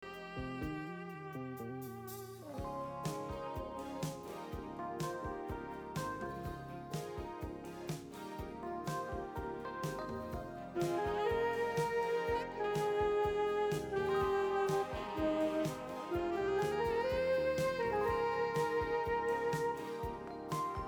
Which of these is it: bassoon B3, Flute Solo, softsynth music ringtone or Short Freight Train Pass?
Flute Solo